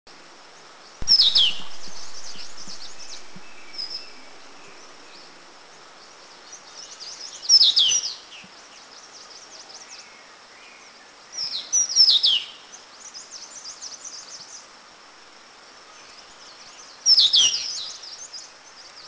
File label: Whiskered Yuhina; Mishmi; India